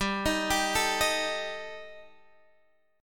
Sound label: G Suspended 2nd Sharp 5th G, Guitar, Drop D; chordshape; down